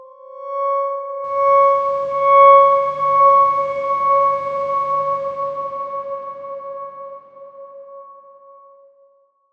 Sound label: Darkswarm C#5 f; Ableton; healing soundscapes